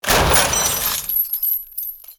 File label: Master Chest Death